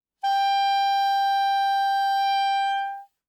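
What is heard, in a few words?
Soprano Saxophone non vibrato ff G5; Woodwinds; Bb Soprano Saxophone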